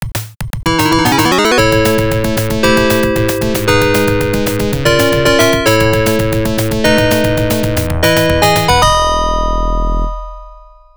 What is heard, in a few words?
Time Trial Start; F-1 Dream; arcade